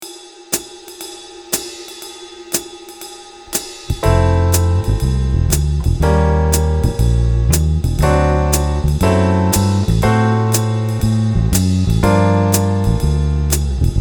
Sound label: Chords And Bass